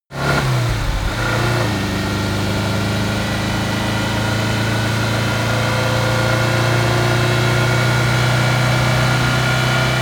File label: car dacia driving accelerate gear shift slow down